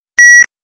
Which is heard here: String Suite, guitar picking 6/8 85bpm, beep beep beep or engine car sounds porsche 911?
beep beep beep